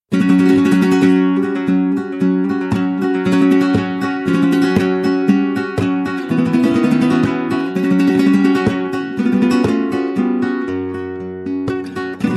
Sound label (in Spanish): Variaciones por Solea